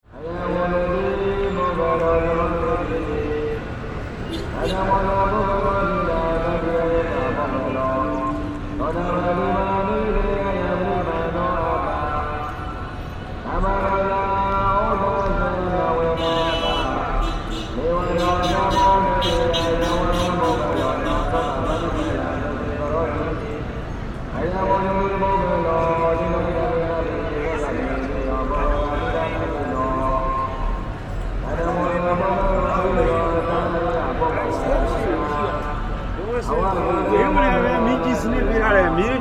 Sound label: PA chant, Burma